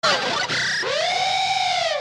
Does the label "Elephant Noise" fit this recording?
Yes